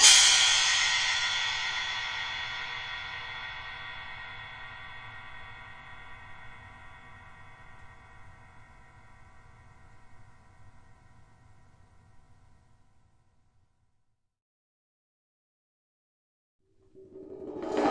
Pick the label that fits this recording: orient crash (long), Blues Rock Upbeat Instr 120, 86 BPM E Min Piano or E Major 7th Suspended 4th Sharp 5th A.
orient crash (long)